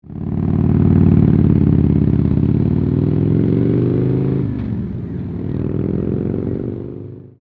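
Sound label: TAKEOFF, motorcycle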